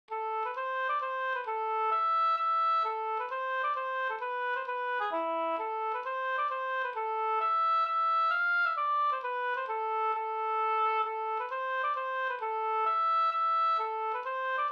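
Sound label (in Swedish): hambopolska, A minor; fr Varnhem nr2 av H Falk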